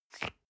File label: toothpaste screw cup open medium single